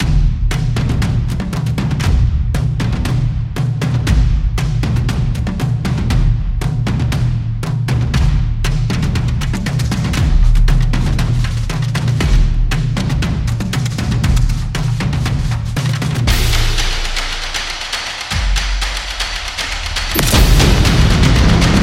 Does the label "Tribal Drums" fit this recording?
Yes